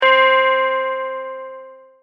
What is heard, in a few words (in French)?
cloche